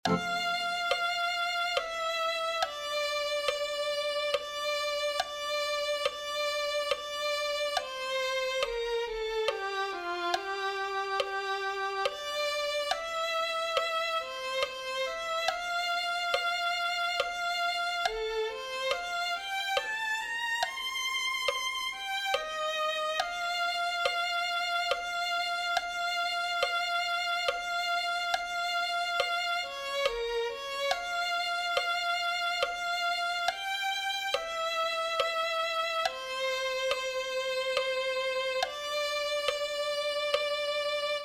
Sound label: violin